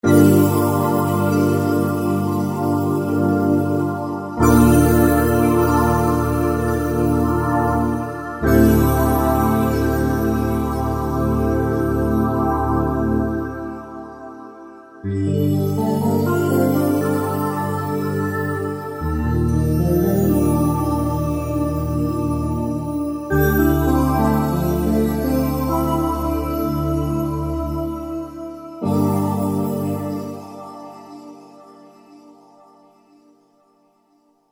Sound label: v-synth d50